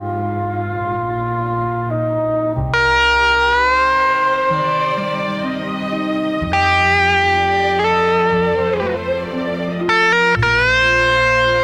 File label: classical